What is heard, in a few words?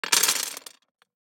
DICE on Glass, Throw and Roll, Standard, 16 Sixteen Dice; Samples; ArticulatedSounds; DICE Sfx Party (Extended)